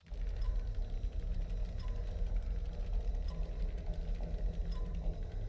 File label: City SFX, Mech Moving Platform Forward